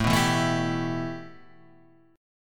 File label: A 7th Suspended 2nd A; A7sus2 Chord {5 2 2 2 5 3} strummed